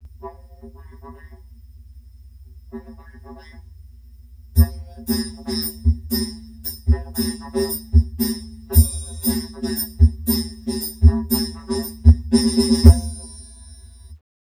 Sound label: no trombone